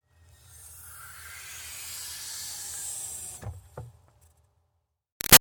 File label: Car Highlander Trunk Air Hiss; 2005 Toyota Highlander V6; Cars; Vehicles; Big Room Sound